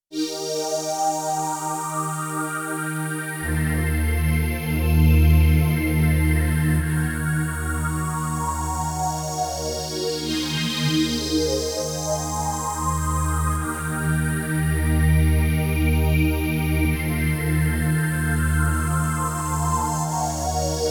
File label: Phazing Pad, Korg Radias-R